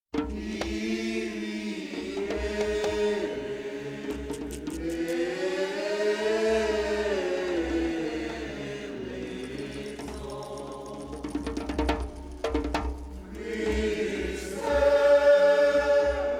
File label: Gregorian Chant Jubilation Gospel Choir; Montreal